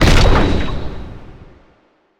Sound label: asteroid Boom; Space Wave Race